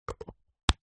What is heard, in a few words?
hairspray can lid on close; household